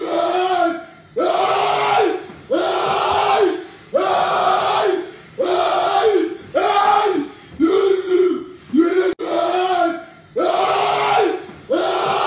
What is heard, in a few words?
ayyyy ayyy